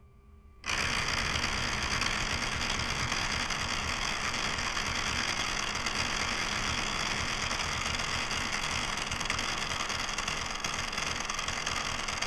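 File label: an old janky valve rotating, machine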